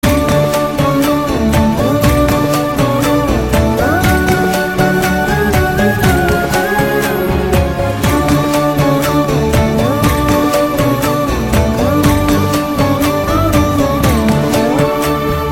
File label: BGM